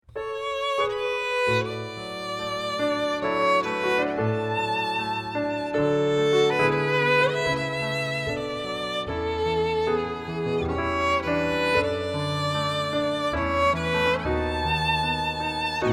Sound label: Violin; Brahms